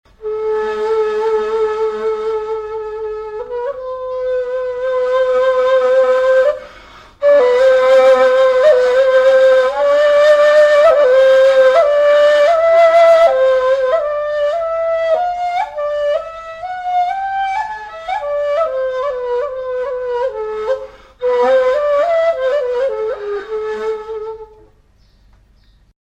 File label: Ney Taksim